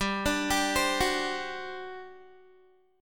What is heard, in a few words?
G Major 7th Suspended 4th, Guitar; GM7sus4 arpeggiated